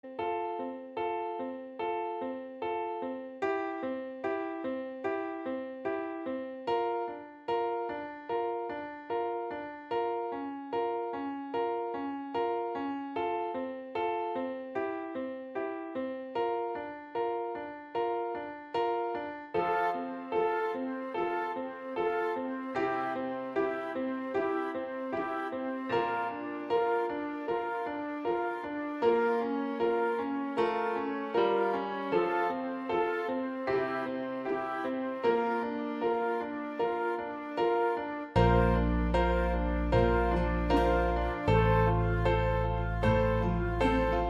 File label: instrumental